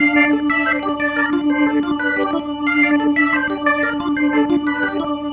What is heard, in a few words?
Pattern, Organ